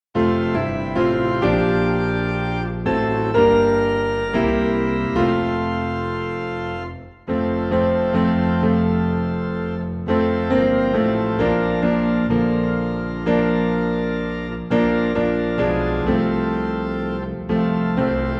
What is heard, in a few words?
Piano and Organ Low in F